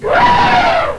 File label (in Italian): elefante, versi degli animali; animalandia